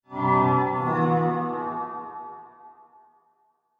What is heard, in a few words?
Guitar Chord Musical Magical Reveal Swell Transforms; Guitars (Sound Effects:Musical)